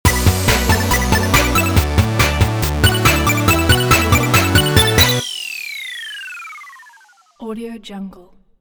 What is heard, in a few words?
8bit chiptune logo; friendlysound